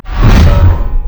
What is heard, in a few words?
basic attack; LoL; garen base